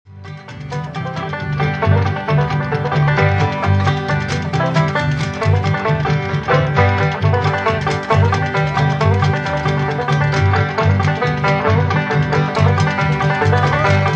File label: Bluegrass